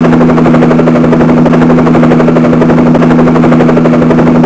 helicopter rotor

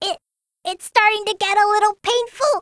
painful

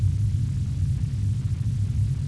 alien noise

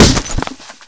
struggle hit

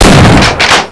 attack

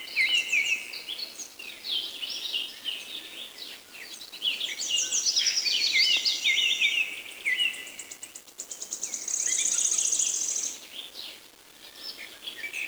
forest

